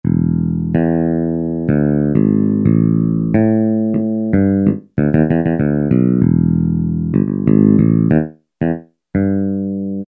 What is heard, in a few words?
Jazz Brg, Yamaha A4000 Guitar; Bass